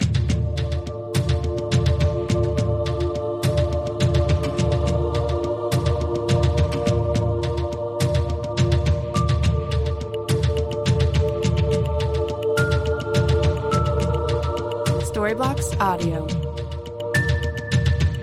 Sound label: cinematic drums choir voices